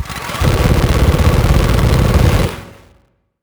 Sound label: Cannon Shot; helltaker